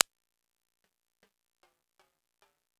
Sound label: Timbale, E-MU Pro-Cussion; drum machine